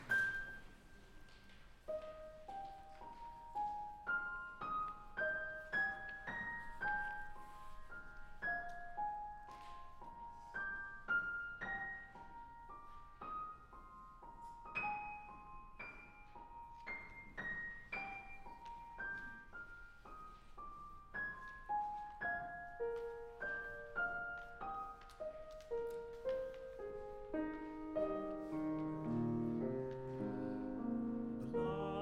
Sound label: Melody